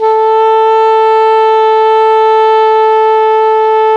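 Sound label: ALTO pianissimo A 3, Sax; Giga Samples Collection; ALTO SAX PP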